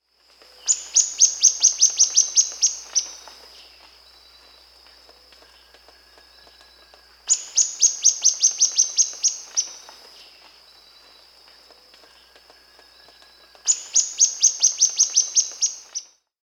Plain Xenops (Xenops minutus), Bolivia, Birds of the Amazon; S. America